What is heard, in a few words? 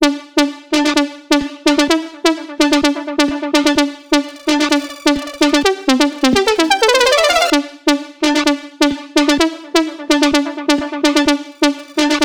128 BPM D Min Lead, House; Drop Loops; Cymatics; Destiny